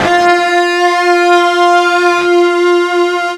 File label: FUZZ F 3, Guitar; East West; Steve Stevens Guitar Samples Collection